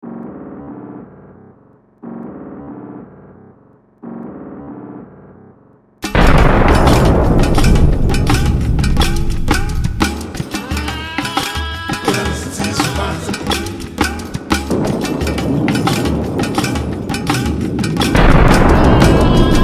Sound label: music